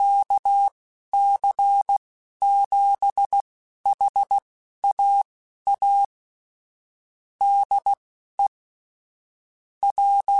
Morse Code, QSOs

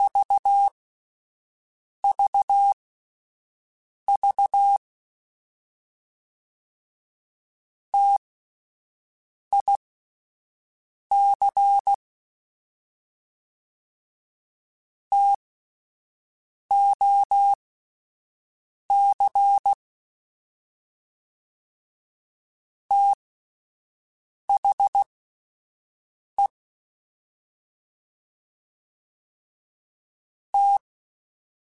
T Runs, Morse Code; CW Code Groups; Mixed; Single T or H; HamRadio; Prefixes

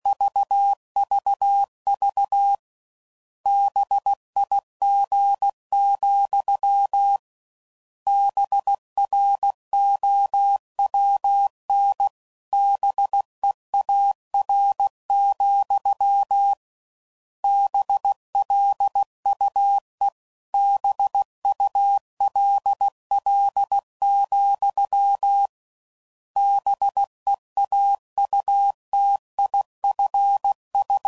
CW Code Groups 15WPM; HamRadio; Morse Code; Mixed

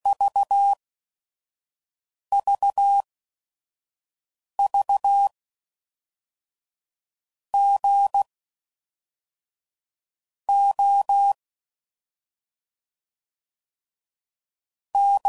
CW Code Groups 5WPM; HamRadio; Morse Code; Mixed

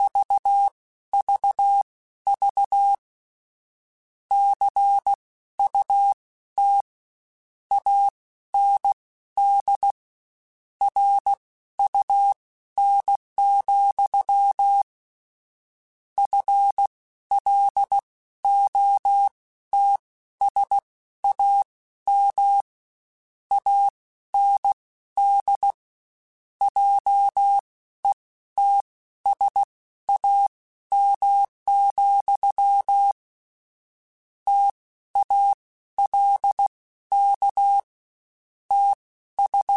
Word Pairs, Morse Code; HamRadio

